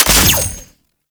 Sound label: Sniper Low Clip; Warframe; Sentient; Caliban Prime